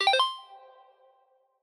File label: racetrack finish